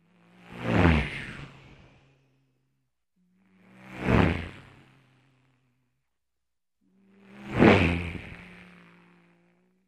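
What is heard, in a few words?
drive-by with voice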